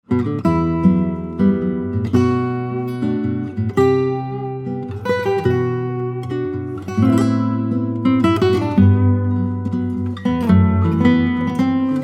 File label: waltz